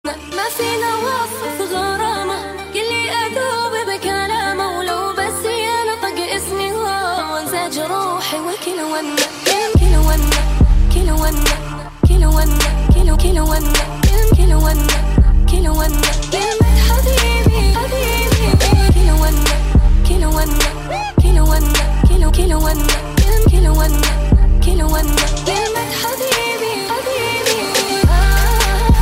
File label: Perfect Trap Tone